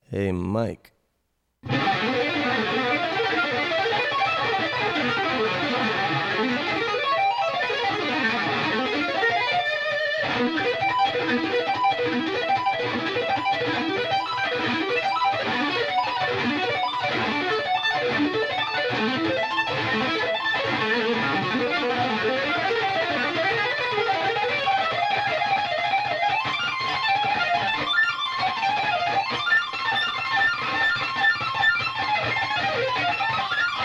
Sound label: Guitar Solo